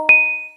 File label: lg velvet 5g notification ringtone